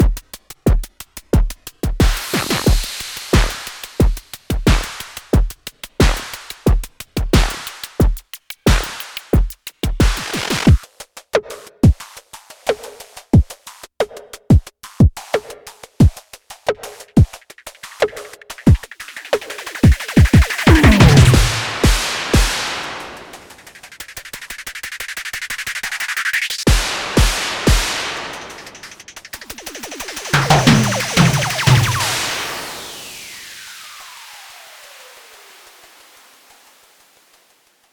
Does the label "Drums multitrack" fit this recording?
Yes